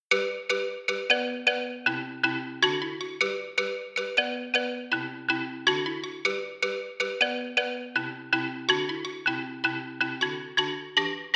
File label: Xylophone; VIVO S10